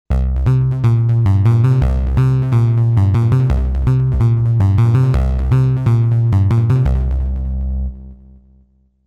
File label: Nightclub Saw; Reason 10; Europa